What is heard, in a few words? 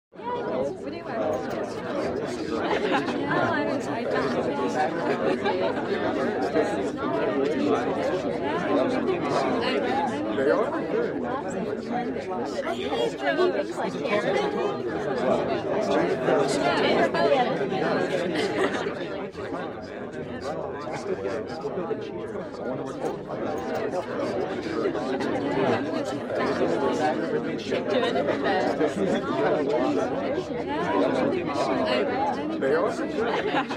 Cocktail Party 50 People Chatting, Interior Crowds